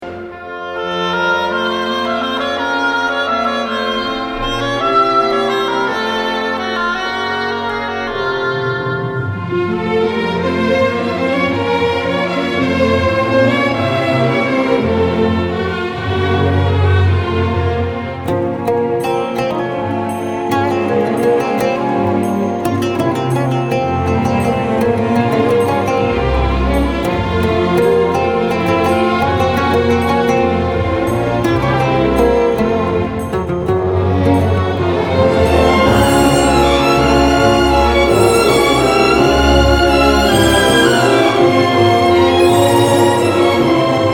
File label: Waltz; Tazanween